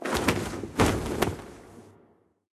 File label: Dragonflight Land-Takeoff